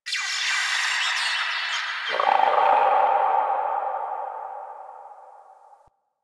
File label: Venus Landscape Cave Creature; Ambience; Warframe; Venus Open World